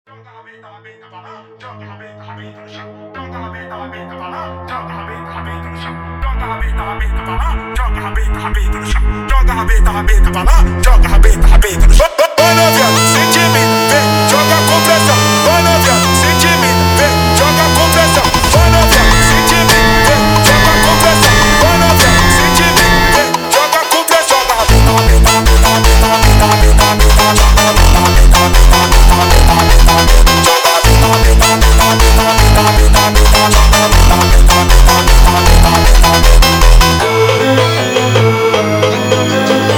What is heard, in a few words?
funk sped up